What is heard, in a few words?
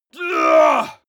male groan strain